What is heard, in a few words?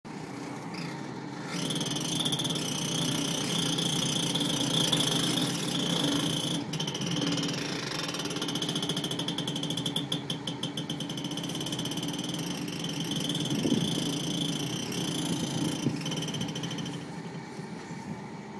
gangway between ship and pier scraping